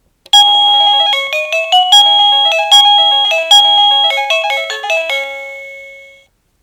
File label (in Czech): melodie